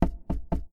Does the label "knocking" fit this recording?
Yes